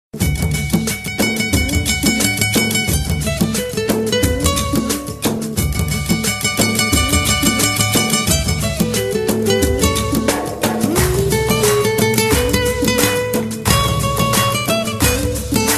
Nice Music

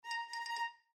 Windows alert

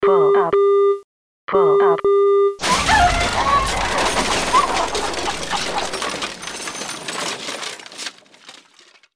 Airplane Crash

